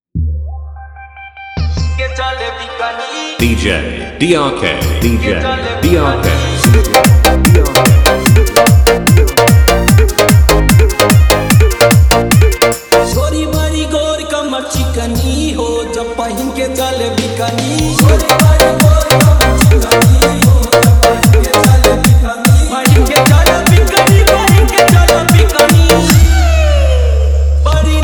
Bhojpuri Remix

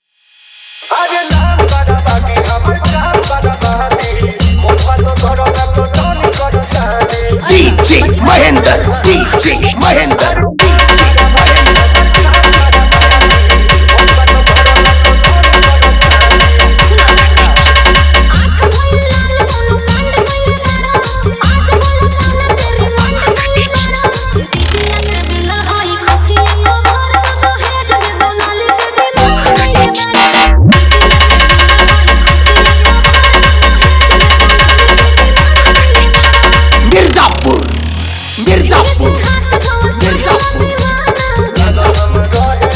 Bhojpuri Remix

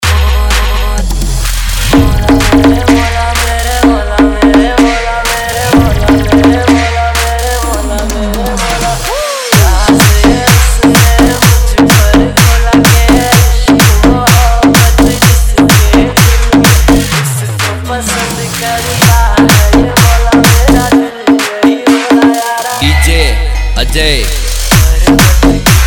Edm Remix

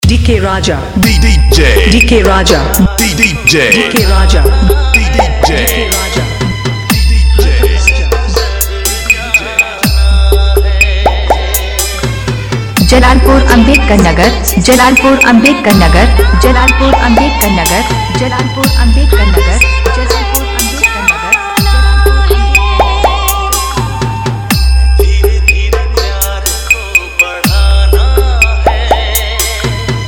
Hindi Remix

